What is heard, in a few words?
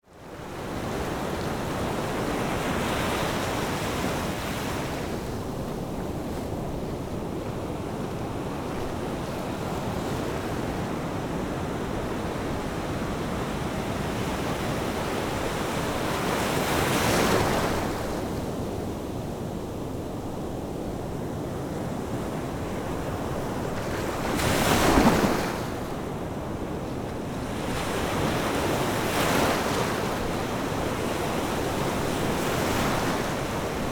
beach; ambient; water